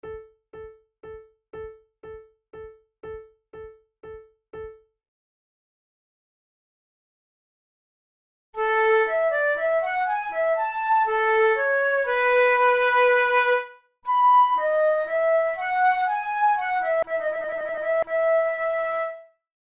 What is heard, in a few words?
courante